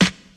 bassy snare drum f key, snare sample tuned to the F note, Loudest frequency: 1714Hz; snare drum samples